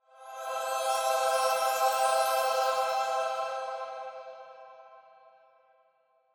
Angel Long Bell

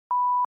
Censor Bleep 1kHz Short

